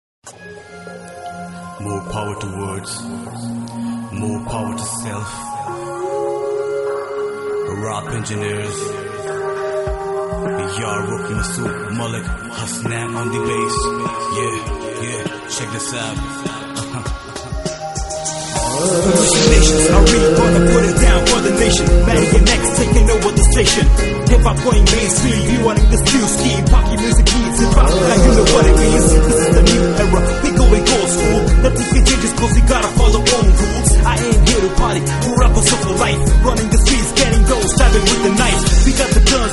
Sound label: Darbari, Rap